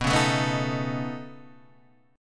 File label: Db 7th Suspended 2nd Sharp 5th